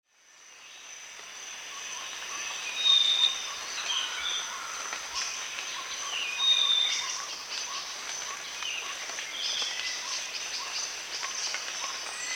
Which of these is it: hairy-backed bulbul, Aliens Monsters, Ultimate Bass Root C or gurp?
hairy-backed bulbul